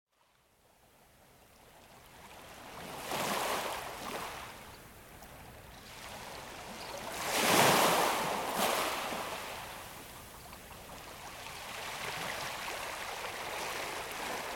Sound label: soft waves at a hidden place